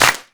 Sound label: clap one shot d sharp key; percussion; single hits one shots